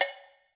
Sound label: Cowbell Muted